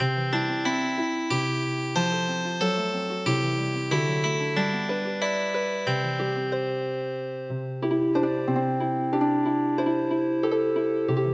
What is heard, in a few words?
Healing Harp, traditional